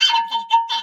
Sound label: Wizard Mini Reload